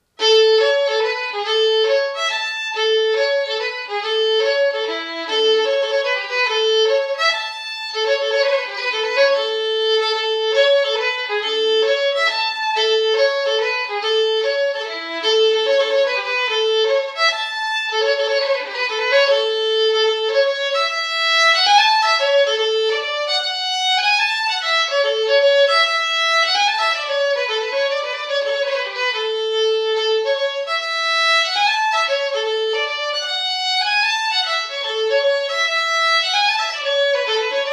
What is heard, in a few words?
Polska